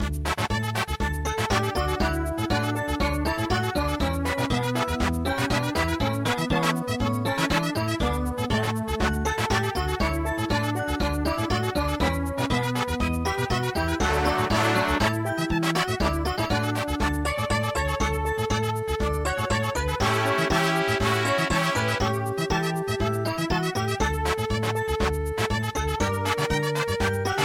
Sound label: mambo, midi; O Sole Mio